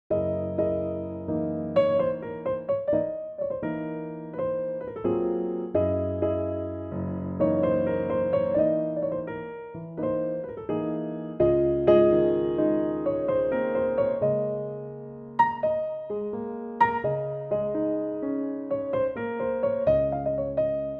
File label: piano trak